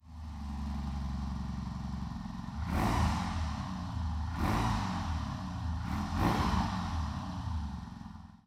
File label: R18 closed; BMW; long